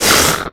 attack; spider

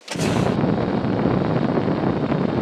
robot movement; npc

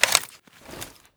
ru-556 mag fix; weapons

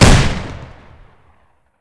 single shotgun fire

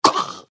feral ghoul injured; npc

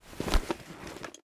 armor step; snds jack gmod

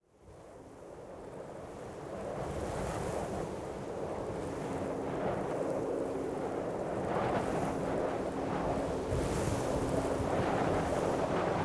ambient

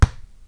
bounce, basketball ball

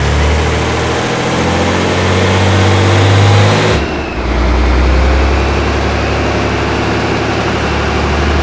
fourth cruise loop, vehicles; ratmobile

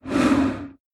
vox idle

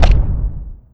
step; cyberdemon

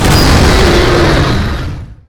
shield roar

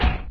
metal step, doom3 pinky

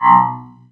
deny

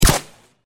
sniper rifle mod fire

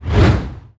swish large

